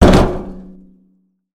land metal barrel, footsteps; player

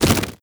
single, antlion wings; creatures

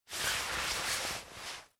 foley jacket

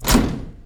metal door open; doors

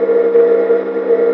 portalgun rotate loop; ambient; Portal 2

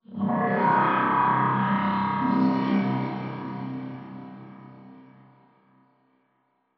strider distant; ambient; combine

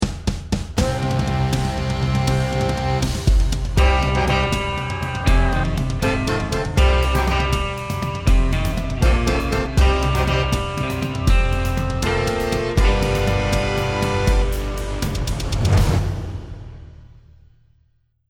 start action

